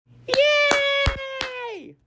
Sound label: YAY